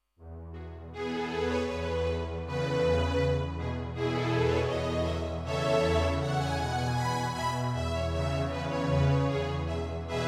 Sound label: Strings